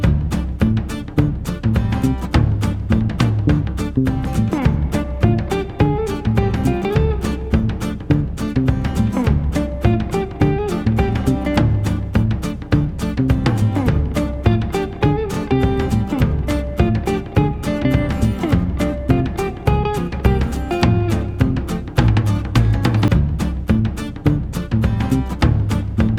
Swing Dancing Loop; Mace and Grace

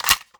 Open Case

